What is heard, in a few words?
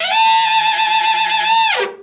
old tenor sax screech; Horns and Brass